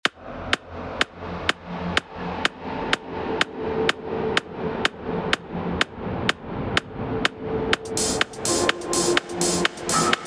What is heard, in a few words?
Pure Analog Sweeps